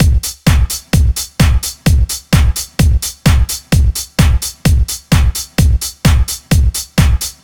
Full Drums, 129bpm, Nu Dance Electro; Zero-G - Soundclash; Dead And Alive

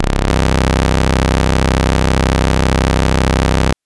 Synth Sync c0; TrashLibrary

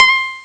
Real Koto, Amiga Soundtracker; ST-XX Amiga Soundtracker Sample Packs

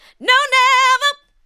ADLIBS

